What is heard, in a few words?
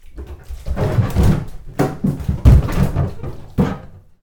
tumbling in a bath; horror